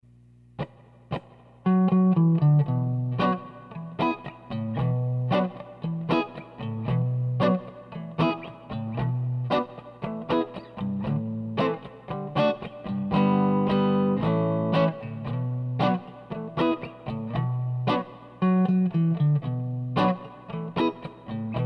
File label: scratch idea; scratch backing track; PNW (first idea, scratch backing track)